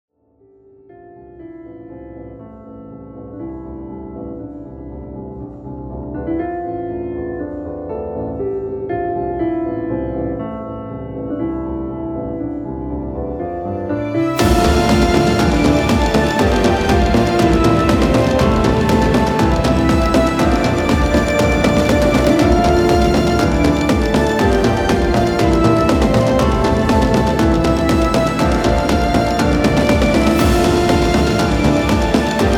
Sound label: cinematic, soundtrack; filmová hudba; originalni soundtrack k filmu